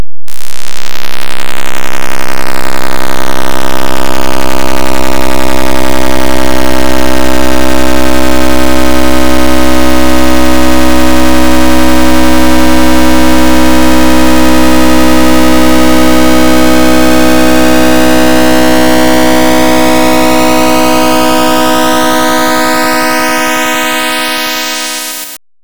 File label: pin pulse method